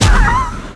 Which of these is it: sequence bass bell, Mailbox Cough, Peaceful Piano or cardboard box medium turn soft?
Mailbox Cough